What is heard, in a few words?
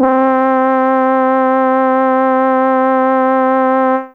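Trumpet C3, Fairlight CMI IIe; Brass2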